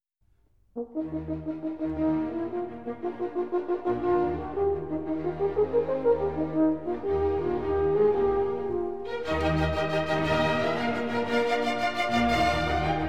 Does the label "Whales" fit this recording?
No